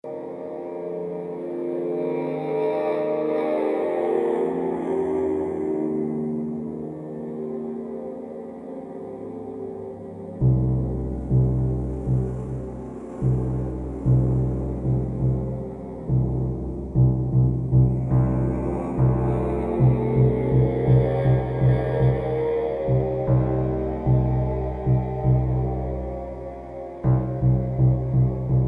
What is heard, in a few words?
Morse